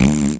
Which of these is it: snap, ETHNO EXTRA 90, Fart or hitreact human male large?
Fart